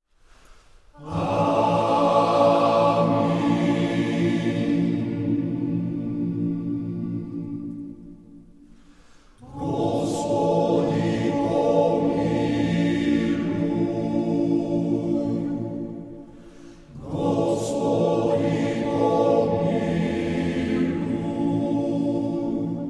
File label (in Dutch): Hymne, mannenkoor